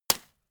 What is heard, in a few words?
gun shot silencer